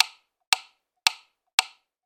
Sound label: musical metronome ticking; sound effects one